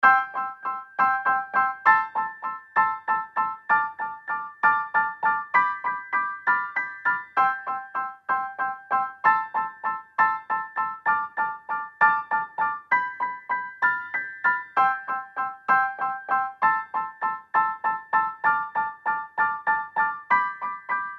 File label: 65 C Bop Keys